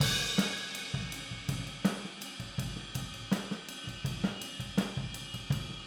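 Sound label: Boogaloo Chorus Overhead stereo, drums, 82 BPM; Drums from the Big Room The Mixes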